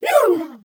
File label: GHOST WOLF